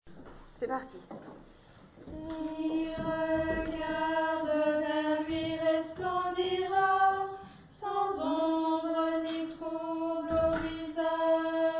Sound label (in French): Voix d'alto; qui regarde vers lui